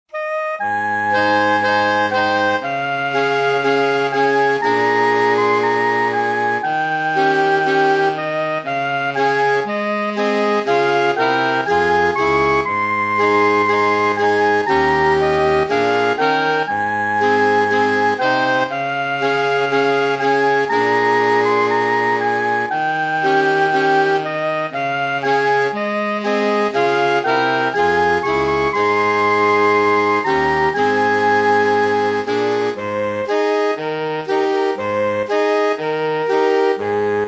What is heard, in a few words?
hiho sax